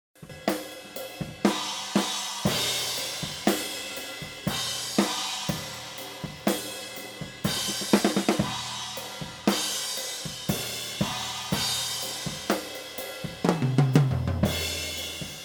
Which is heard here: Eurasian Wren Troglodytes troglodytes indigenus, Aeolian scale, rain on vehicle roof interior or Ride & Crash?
Ride & Crash